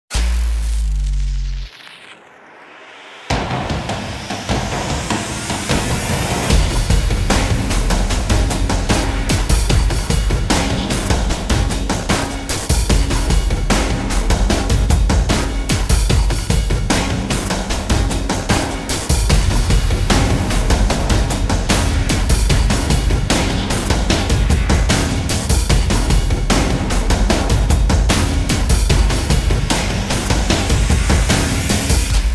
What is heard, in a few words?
Percussion; Fired Earth Music